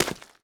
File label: mud, footsteps; player